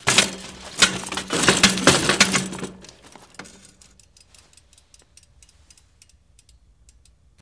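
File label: Bike Hit Movement Tire Spi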